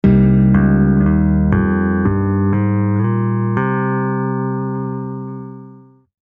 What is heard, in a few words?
Phrygian